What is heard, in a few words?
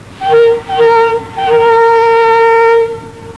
flute; match